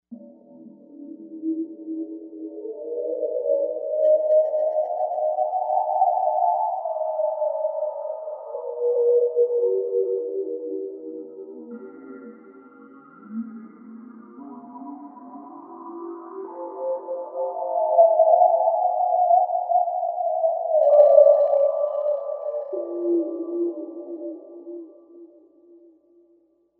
Water Phone, Novation UltraNova; Factory Patches

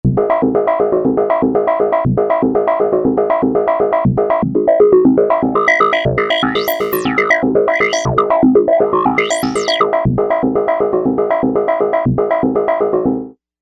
FMod Seq

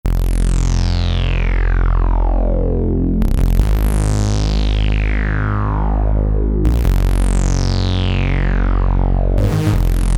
Modular Resobass

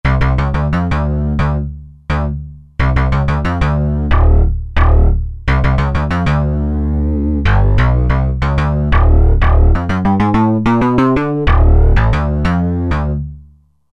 Wiry Sync Bass, Roland JD-990; Factory Patches

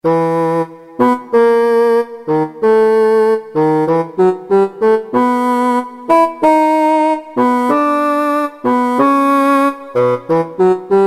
Solo Bassoon, Kurzweil K2000 Orchestral; Kurzweil K2000 Orchestral ROM

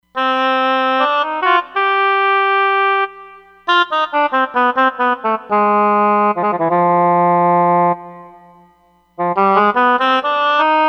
Hall Oboe, Roland Fantom XR

